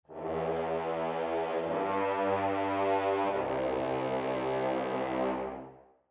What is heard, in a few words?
Brass 6 Fhs Portato